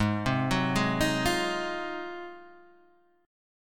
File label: Ab 13th Ab Guitar Half Step Down